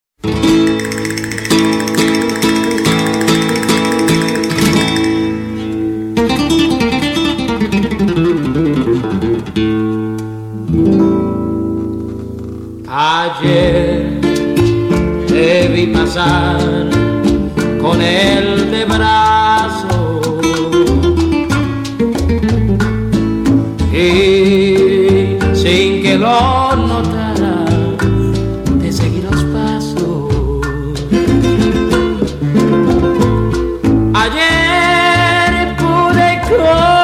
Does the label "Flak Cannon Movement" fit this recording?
No